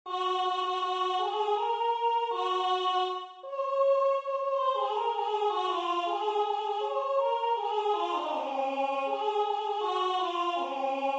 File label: rhythm